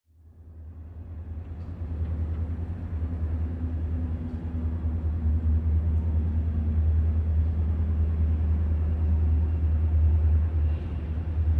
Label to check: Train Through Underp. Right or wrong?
Right